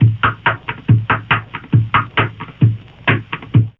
OLD DRUMS, ETHNIC STUFF

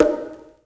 BONGO HALLLO

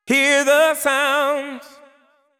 deep house male, 125, A, vocals

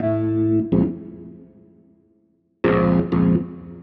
DEEPHOUSE ORGAN 125 A

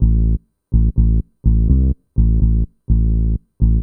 DEEPHOUSE BASS 125 A

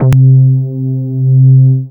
BASS MINIMOO

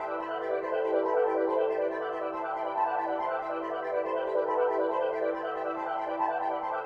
SPACE PAD 140 A; SpaceSounds

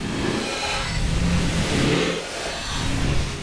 TECHNO FX 140

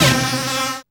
dance chord

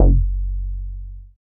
BASS MINIMOO

